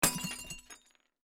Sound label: Jam Destroying; my cute commissar 2020 gamerip